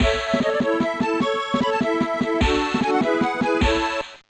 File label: Jingle; super bust a move; game boy advance